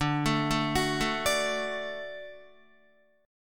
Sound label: D Major D down Guitar; D arpeggiated; JGuitar